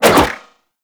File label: Golden Band Staff Spin Whoosh; Warframe; Monkey King